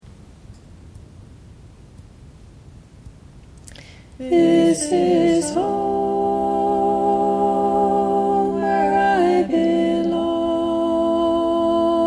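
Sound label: Soprano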